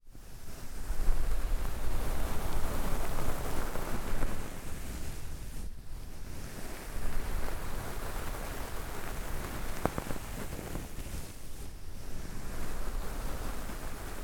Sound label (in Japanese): 硬めタオルマッサージ